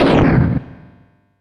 Barrel Cannon; Super Smash Bros

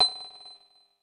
Item Appear; Super Smash Bros

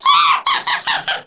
Laugh; New Super Mario Bros. Wii

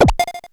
World Map Enemy Defeat; Wii

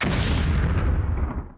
Explosion; New Super Mario Bros. Wii

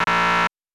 Denied; Super Smash Bros

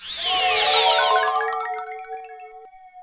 Magic; New Super Mario Bros. Wii

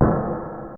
explode; super mario paint

